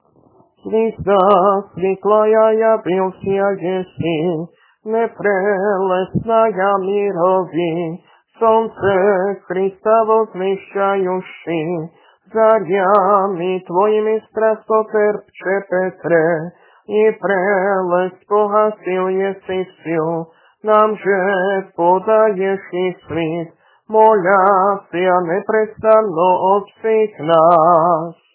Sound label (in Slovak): Kondák mučeníkovi, hlas 2; nedelna-skola